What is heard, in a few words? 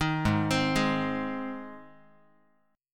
G sharp Major G sharp Guitar, chord; arpeggiated; JGuitar